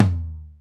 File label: TOM, DrumBase5000; Crypton; CFZ KIT 2